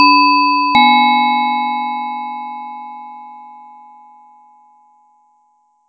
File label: Announcement (2-tones) - Bing,Bong